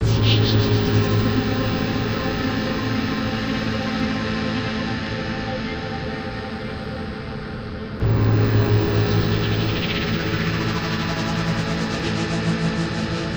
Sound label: ambient